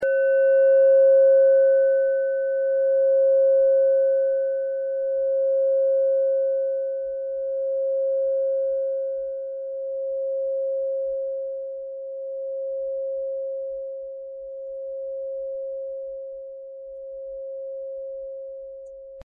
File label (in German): klangschale nepal, Klangschalen Nepal